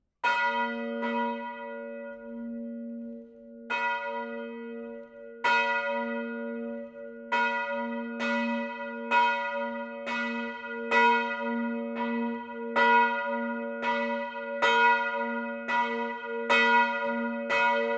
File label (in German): Glocke; Glocke von 1927